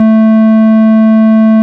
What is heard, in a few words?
Farfisa; Farfisa Clar DBar